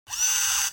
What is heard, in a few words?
shoot start, weapons; blockas2